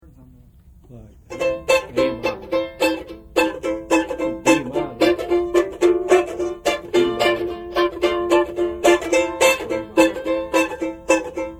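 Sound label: Chords, mandolin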